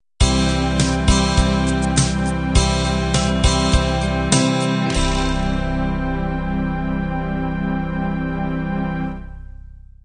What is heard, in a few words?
pop ballad end